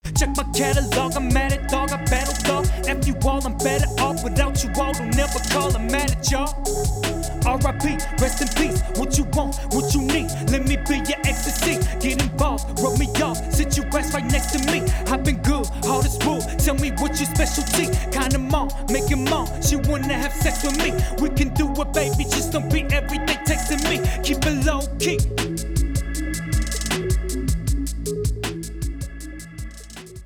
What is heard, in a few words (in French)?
vcl515 mix avec